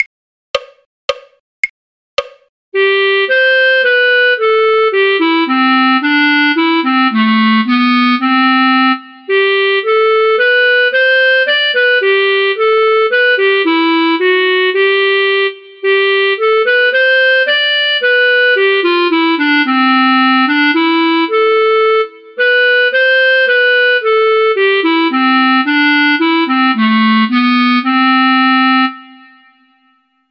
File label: clarinet; sweet polly oliver; folk